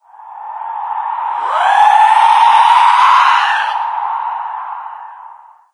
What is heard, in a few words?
chatter, lostsoul; doom3